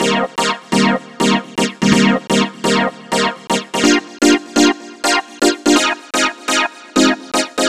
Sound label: SYN 125, House synth loops